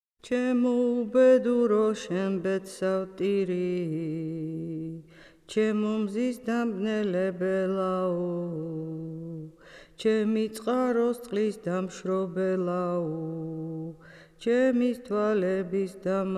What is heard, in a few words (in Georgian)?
zuzuni fshauri saweso-shromis; Mzetamze